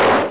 anti aircraft gun

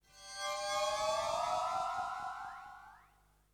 ethno 125

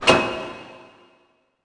lay pipe; PC Gamer IT CD 40; interface

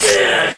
Fencing Hit Captain; Pirates Of Treasure Island

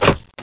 SWITCH

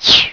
Swish; Ultima III

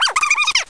warn; The Best of Select Games Special 4

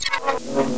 Sw Swing

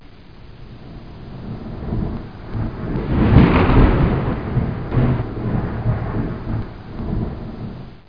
BG Noise; Ultra Vixen 2

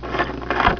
Unlock; IQ2 Demo

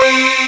CHOIR; Descent II

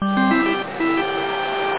rally

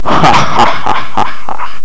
hahahaha

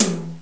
Rock Tom 5; GMPlay; XG

